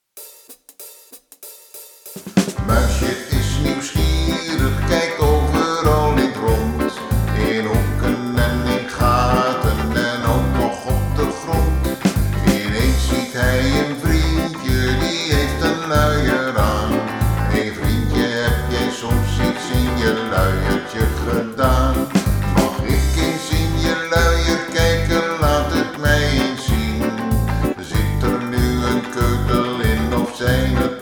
zang; Bieb Almere

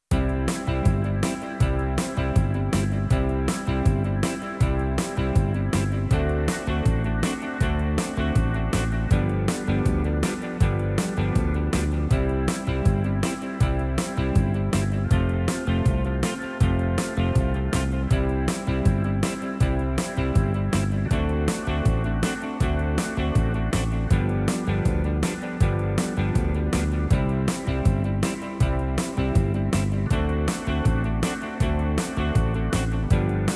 karaoke; Bieb Almere

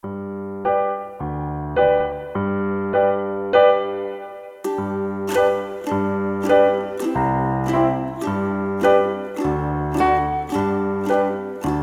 alleen muziek; Bieb Almere

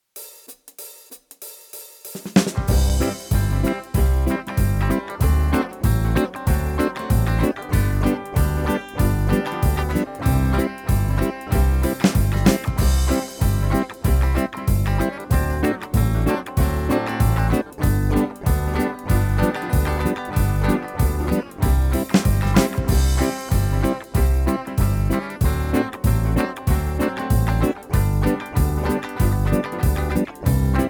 alleen muziek; Bieb Almere

